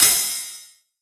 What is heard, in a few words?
Eucalyptus Ride, Dubstep Cymbals; One-Shots; Drums; Ghosthack; Ghosthack - AC2024 - Day 13 - Dubstep Sounds 2025